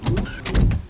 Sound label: meep